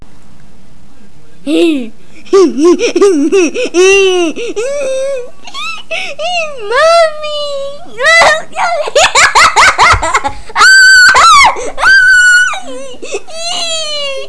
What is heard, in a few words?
laff